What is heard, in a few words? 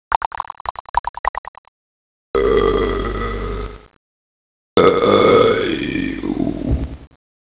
burp; RunFight; Simulator